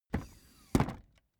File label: office chair hydraulics up